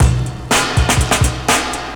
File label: Breakbeat, Drums & Percussion